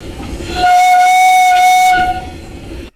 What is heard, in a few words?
vintage steam locomotive whistle blowing; trains